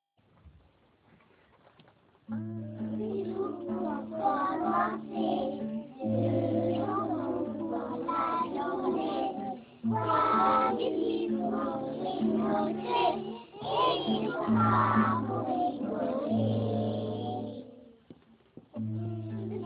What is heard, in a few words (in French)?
Chant